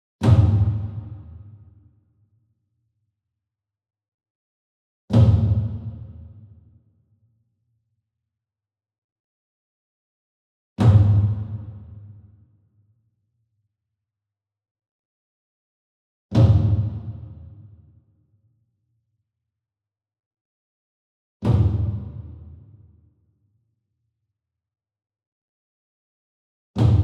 SINGLE DRUM Kodo Flam Soft Beater Far, Cinematic Strikes; boom library; Cinematic Strikes Construction Kit